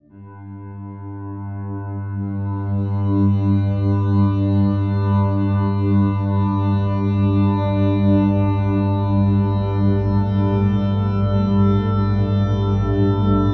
WARM 5THS; Bolder Sounds - Granular

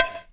bongo, Percussion; InVision Interactive; Percussion Slam

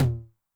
HI TOM, Noise, 909

